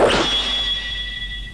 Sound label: swish